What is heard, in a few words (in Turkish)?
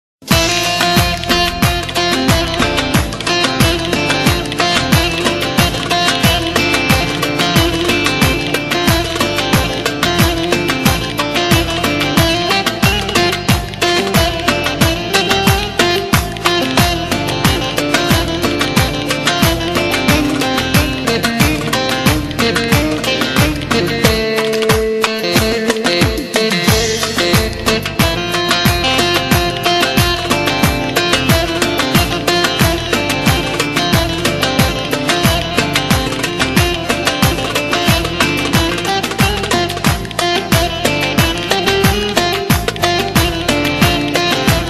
elektro baglama oyun havasi saz; misket; ankara; schaller